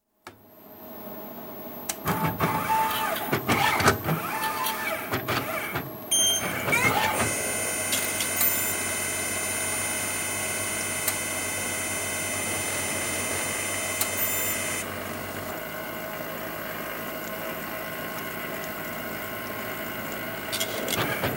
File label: Chemical centrifugal analyzer; Hospital; Hospitals (Sound Effects:Backgrounds); BBC